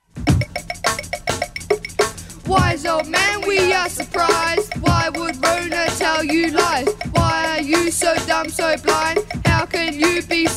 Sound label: Rap